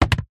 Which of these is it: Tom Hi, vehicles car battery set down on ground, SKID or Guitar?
vehicles car battery set down on ground